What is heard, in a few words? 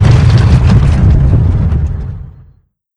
takeoff; endless sky